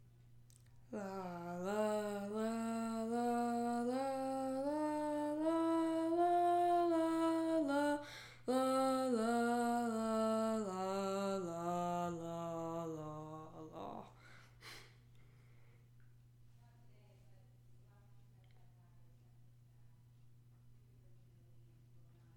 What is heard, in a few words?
Tenor; allstate2024 jrhigh